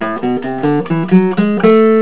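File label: Scale Ascending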